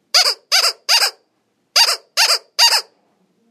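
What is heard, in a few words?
tpr squeak, dog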